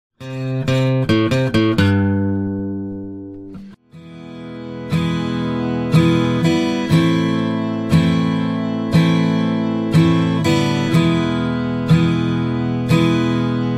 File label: orginal nokia guitar ringtone